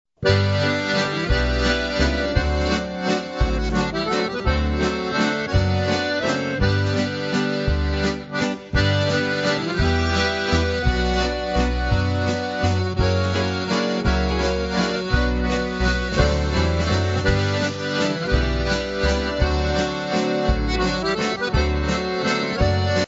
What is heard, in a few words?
waltz